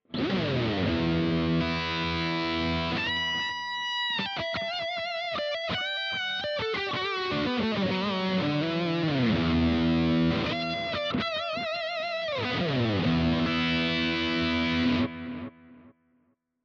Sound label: DUAL CUBE Extreme wet Lead